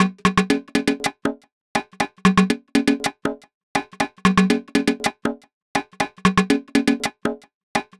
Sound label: Perc Loop 120BPM Machine Synthetic